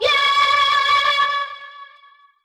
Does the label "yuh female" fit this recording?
Yes